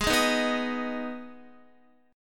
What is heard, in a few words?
Ab Minor Ab down Guitar; Abm strummed; JGuitar